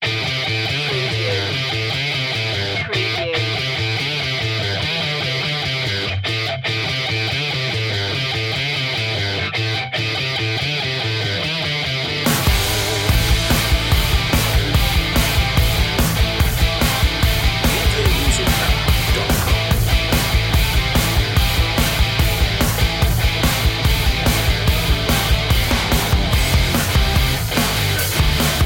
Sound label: pedal to the metal